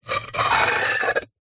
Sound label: household pot ceramic base lid plate scrape